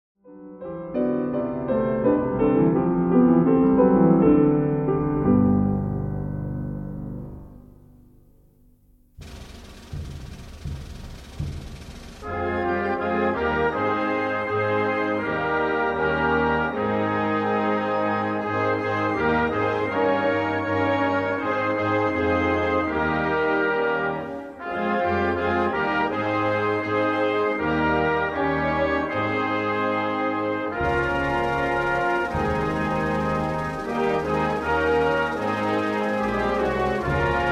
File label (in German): Hymne